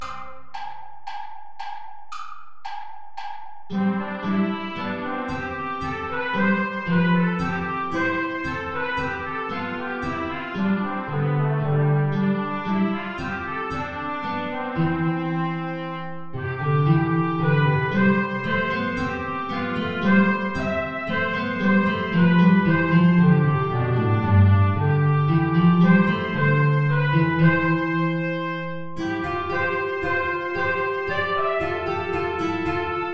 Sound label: air play along without contrabass